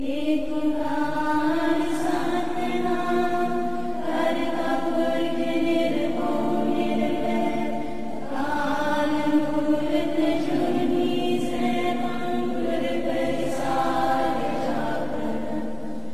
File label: Sikh Prayer